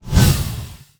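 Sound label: spell fireball fail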